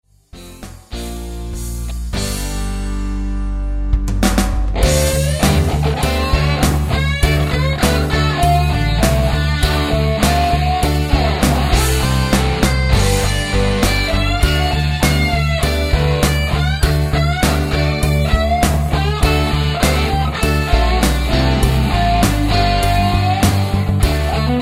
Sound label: ROCK SOLO